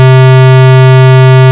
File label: OB SQ, Synths; Multisamples; Ableton Live 12 Lite; Core Library